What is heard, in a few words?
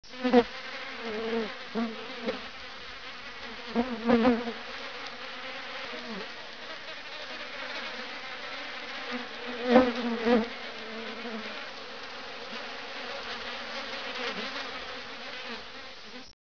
street fly; de bgu